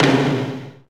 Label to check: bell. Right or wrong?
Wrong